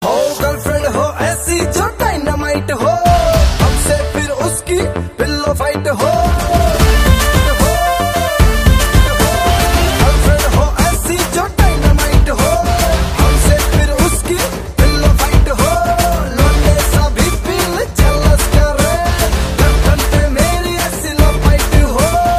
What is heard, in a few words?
bollywood ringtone